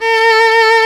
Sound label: STR SOLO, String; Roland - String Master Series; Chamber Grp1